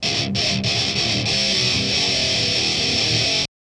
Loop

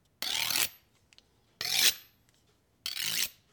Blade To Blade Knives

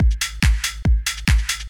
Tech loop

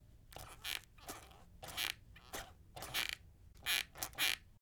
Skateboard Squeak

